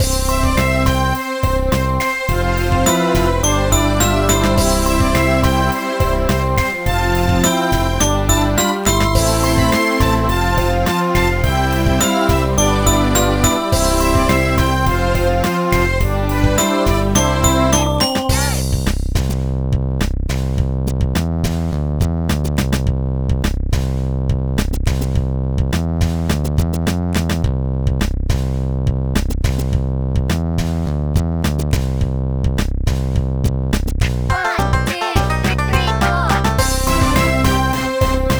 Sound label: Music ZOO BPM100; Super Scribblenauts 2010 NDS; LVL15 ZOO2